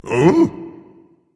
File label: confused, berserker; cuchulainn